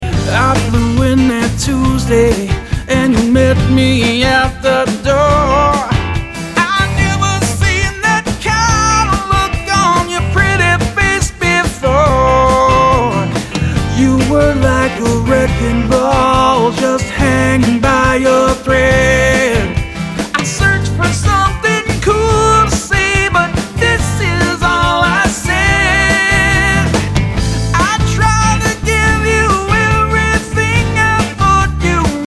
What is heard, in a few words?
Blues; Linolium